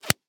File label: Latex Glove; Blastwave